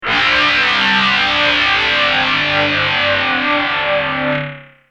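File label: guitar, AD202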